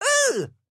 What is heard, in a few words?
hurt